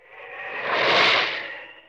tornado; myLittlePony

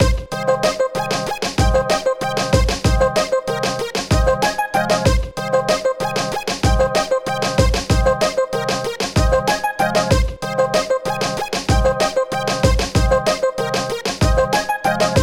music; cheesy underwater adventure; follow That Turtle

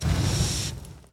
wind; cheesy african; basket Balance

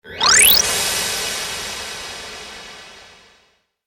convert

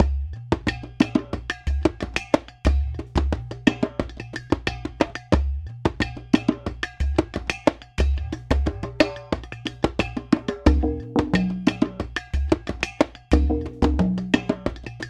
music; cheesy african; basket Balance

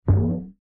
brakes; cheesy-themepark; superSlideSplash

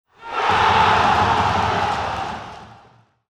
level success; lego-city-sea

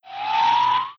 speed Boost; cheesy-themepark; superSlideSplash

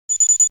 answer right; RallyX

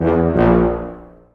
wrong Way; myLittlePony

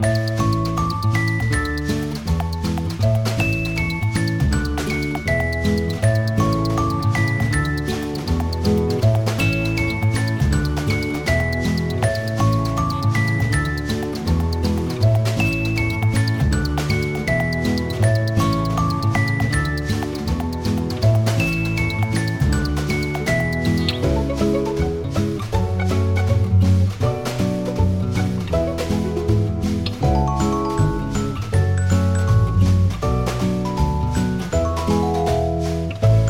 music

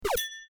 pickup; RallyX

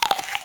shark bite; cheesy-pirate; sailingWithSharks

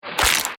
splat

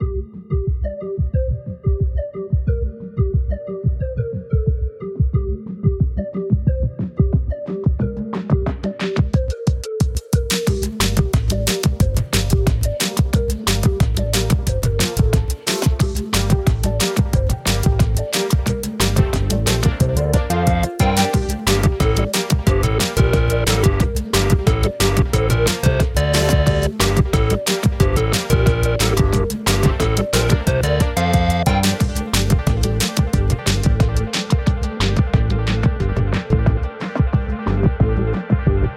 music; cheesy african; treetop Adventure

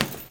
tackle; cheesy-sports; quarterback

